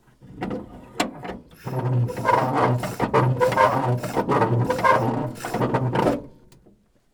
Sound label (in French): MANIVELLE ET COMPRESSEUR